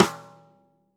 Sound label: stickshot - snares on; JazzFunkKit